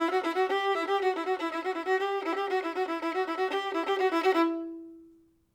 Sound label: violin